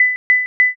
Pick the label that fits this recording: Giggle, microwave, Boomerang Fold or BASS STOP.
microwave